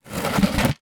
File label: leisure draughts checkers outdoors large plastic piece move slide